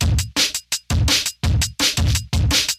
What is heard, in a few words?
jungle, beats; lmms